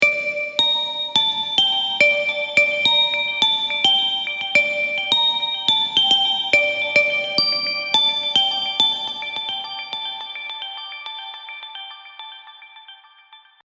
serum plucks; chiqui audio

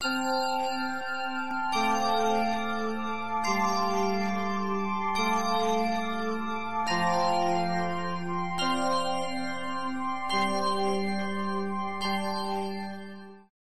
pad glassy dreams

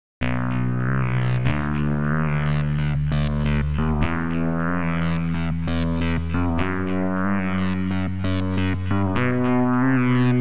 riding steps seq

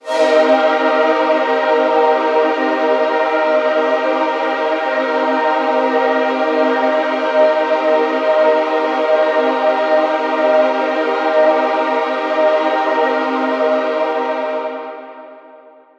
arena reverb synth strings

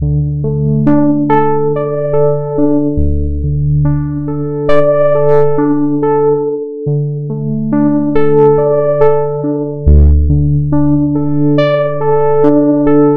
keys aquamarine

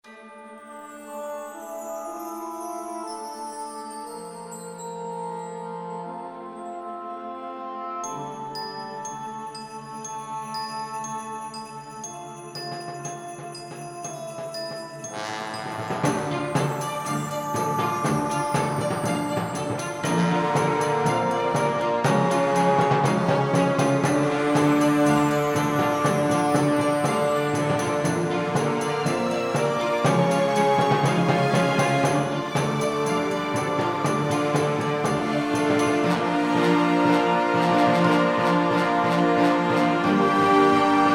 winter song

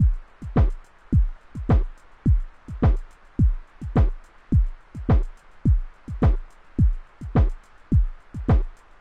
106bpm tape

